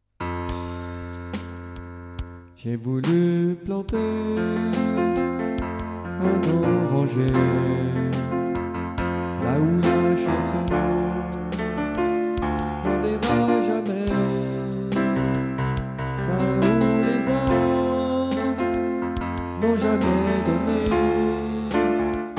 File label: ballad sop; ballade nord irlandaise (soprano); chants